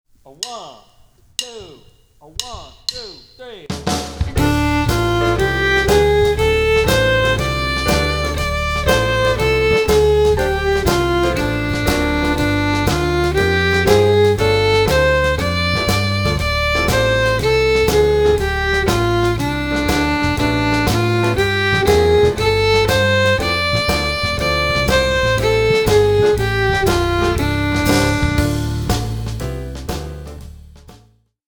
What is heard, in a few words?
Play-Along D Blues Scale, Three Times